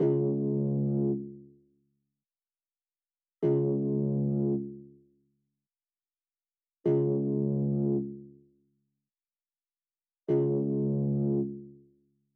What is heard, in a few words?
140 BPM C# Min Bass; Melody; Loop Stems; Cymatics; 2022 Melody Collection Platinum Expansion - Pt 1; Madness